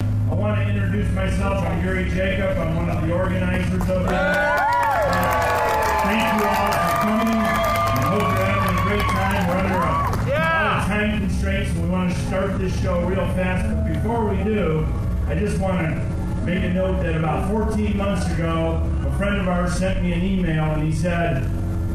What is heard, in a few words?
Gtr Presentation; Gretsch